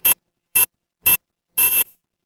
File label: ui timer